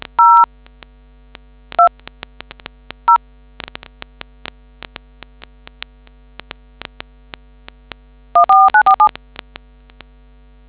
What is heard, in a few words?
dtmf